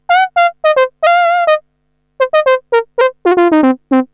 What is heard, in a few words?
gliding power saw